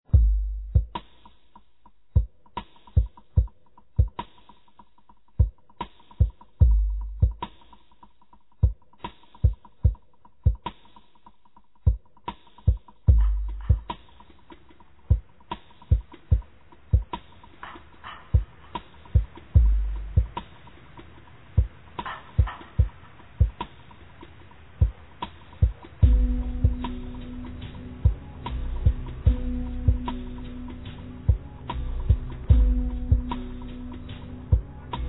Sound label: rainfall